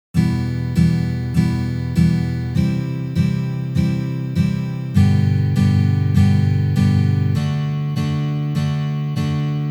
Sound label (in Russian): Uprazhneniya dlya igry akkorda Mi minor na gitare